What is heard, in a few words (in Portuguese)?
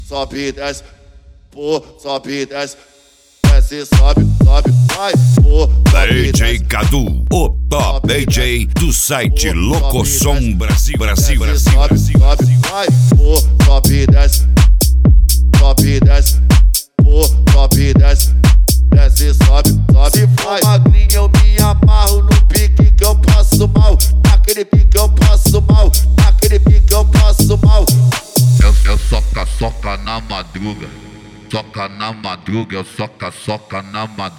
mega funk; set mega funk vol2; especial mega funk vol2 agosto